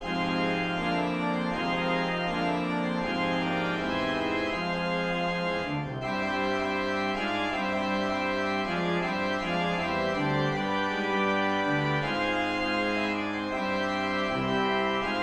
Syncopated Santa for organ

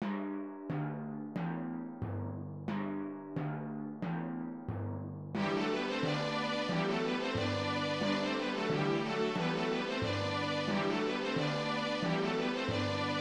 Fanfare

